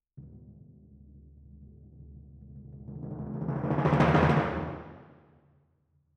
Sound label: Timp Main